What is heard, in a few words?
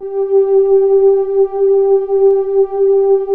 STRING PADS; Pro-Rec - Synthsonic